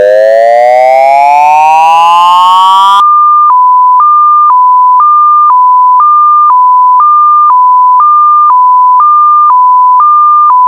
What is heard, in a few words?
Hi-Low